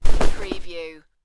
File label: Cloth Character Movement Foley Whoosh